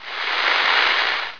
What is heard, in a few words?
STATIC; MPG-Net (USA); imperium